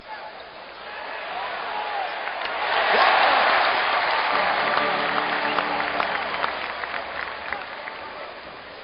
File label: basketball game